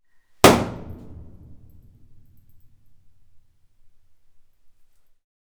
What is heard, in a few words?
Impulse Short